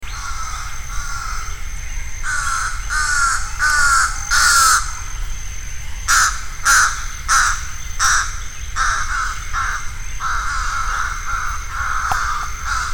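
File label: crimson-winged woodpecker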